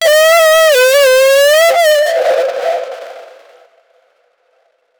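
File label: a classic roblox type, retro; 2009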